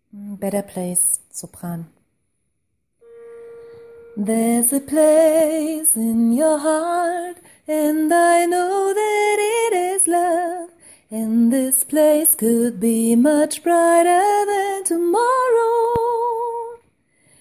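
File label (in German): Better place sopran, gospel choir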